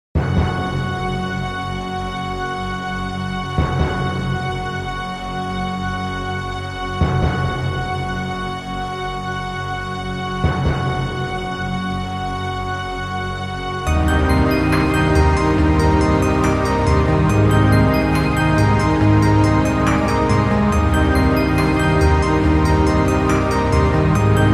Scifi Theme Royalty Free Theme Music

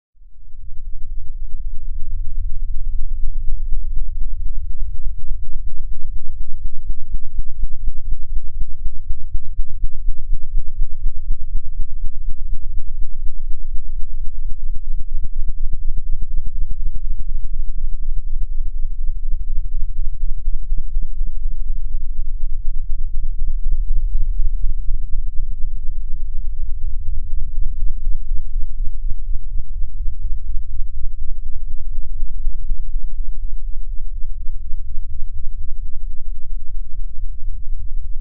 Solar Eddies Sound Effect

